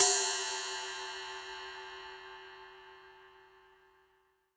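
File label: CYM RIDE, ride cymbal; Sound & Vision - Gigapack I; mono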